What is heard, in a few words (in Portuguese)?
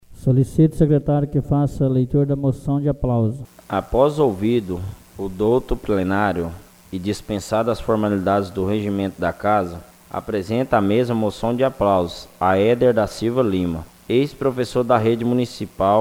Aplausos; 68a sessão ordinária; 8a legislatura